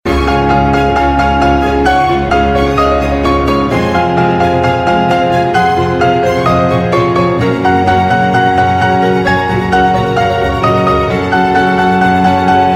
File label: Allegro Cantabile